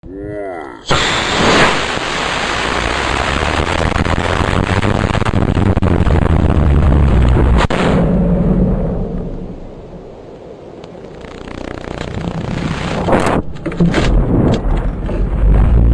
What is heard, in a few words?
Flight half speed